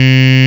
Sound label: vox, Digital Synths; Ableton Foundation FreePacks